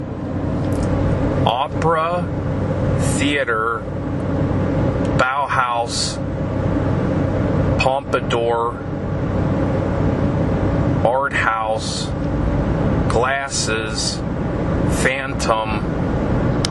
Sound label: Opera Theatre Art House Glasses; Bauhas Pompadour; Phantom